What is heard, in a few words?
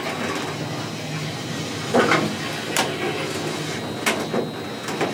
turret; World of Tanks